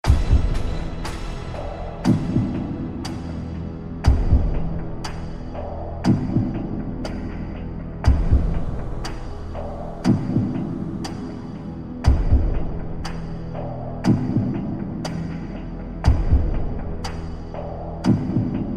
Clock Format; WWTBAM Clock Format - 15 question (short 45 sec); Millionaire Soundtrack; US Clock (2008)

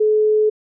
ct busy, iOS UISounds; iOS10

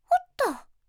default beep; Windows; Madobe Nanami

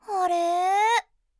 exclamation; Windows; Madobe Nanami

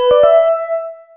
triple ping; Linux; notifications

